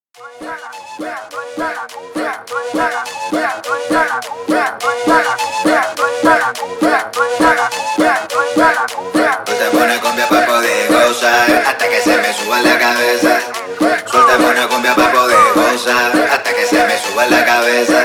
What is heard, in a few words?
cumbia